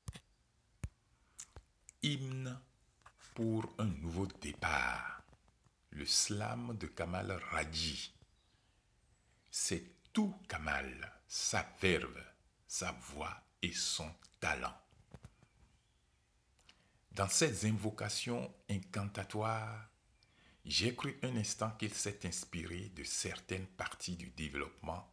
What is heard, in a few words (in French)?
hymne